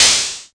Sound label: subtle reverb open high hat sound d sharp key